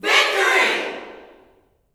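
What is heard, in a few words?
Victory shout, Vocal Gospel yell shout; Spectrasonics; Vocal Planet Vol.1 Gospel; akai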